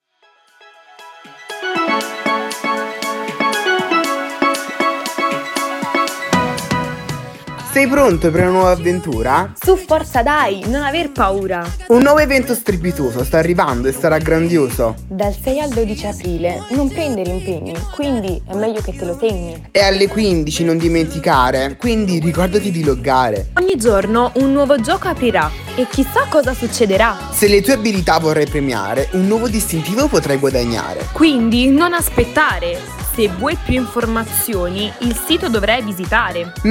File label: Jingle; Quackrantena; HT